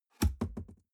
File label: cardboard box open unfold bounce; objekte